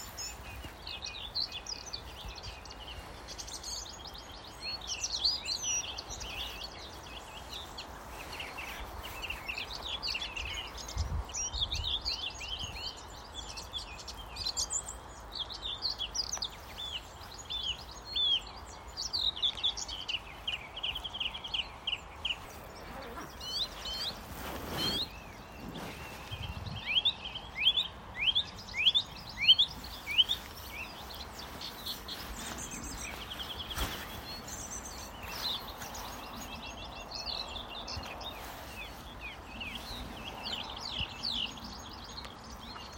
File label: bird song